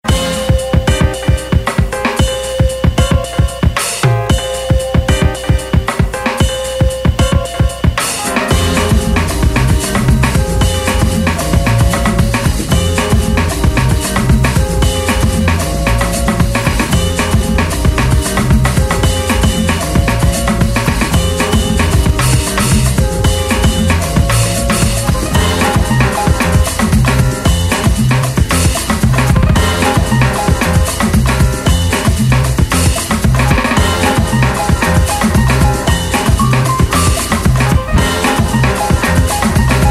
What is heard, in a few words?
Sample Breaks